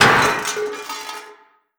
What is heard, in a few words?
junk impact; scripted sequences; reviver; room escape